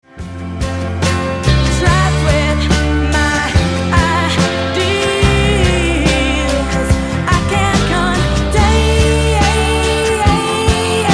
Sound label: Female Voices